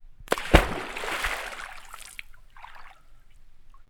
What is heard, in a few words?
Water; TOOLKIT vol.2